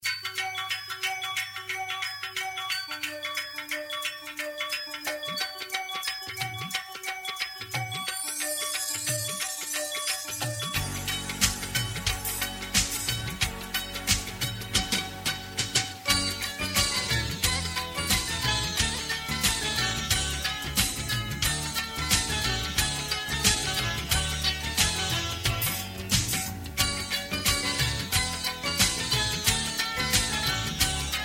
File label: Bhangra Is King